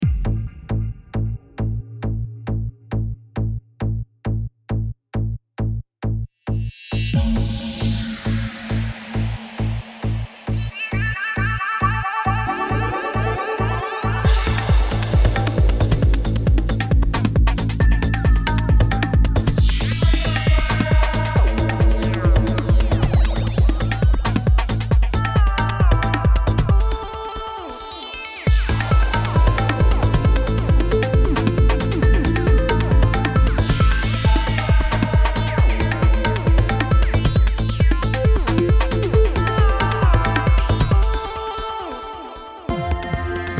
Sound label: trance bank